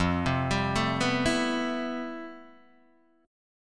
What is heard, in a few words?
F Major Sharp 11th Guitar DADGAD, chord